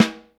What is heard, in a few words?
Snare Drum, 5X14